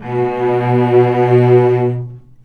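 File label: cellos attack B2 R, String; arco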